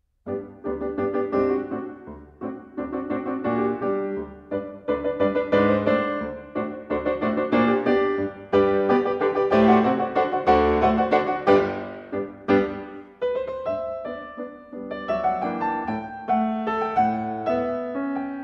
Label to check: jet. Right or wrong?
Wrong